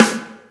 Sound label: snare, drums; virtual drum sounds; custom drums